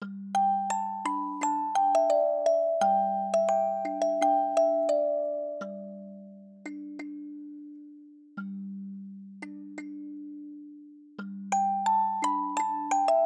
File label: Spring Blossoms (Music Box)